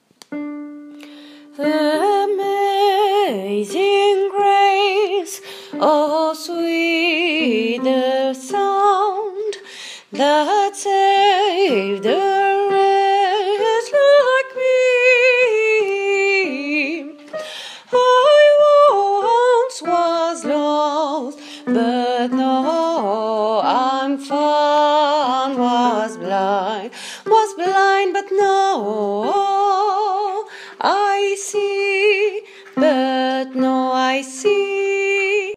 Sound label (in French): basse